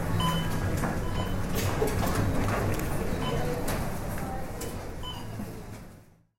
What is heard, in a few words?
mall; city